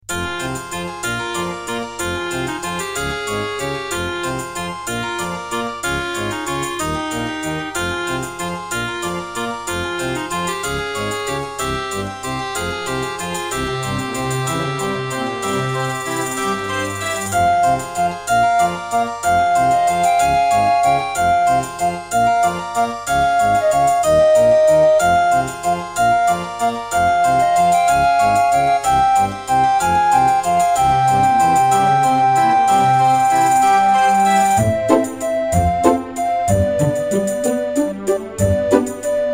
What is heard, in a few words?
Yamaha DB50XG GS MODE; Death Gate